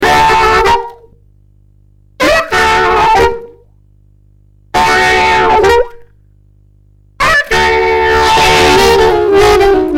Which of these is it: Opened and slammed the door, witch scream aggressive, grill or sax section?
sax section